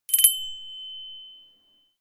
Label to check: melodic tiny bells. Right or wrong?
Right